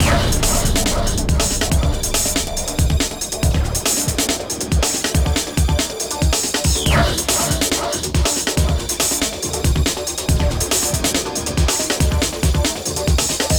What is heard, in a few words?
High Groove 1; Unreal II The Awakening; Acheron